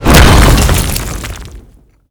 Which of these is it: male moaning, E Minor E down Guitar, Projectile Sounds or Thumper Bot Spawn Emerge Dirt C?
Projectile Sounds